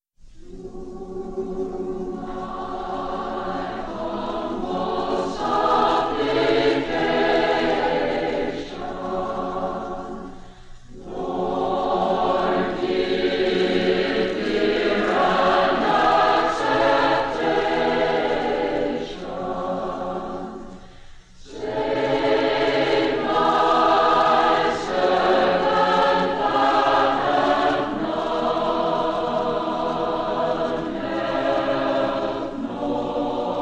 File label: choir